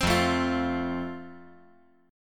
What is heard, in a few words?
F Major